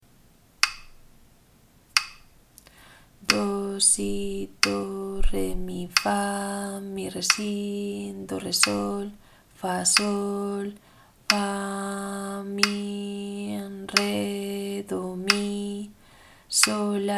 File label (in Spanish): ritmo do; Do en 3ª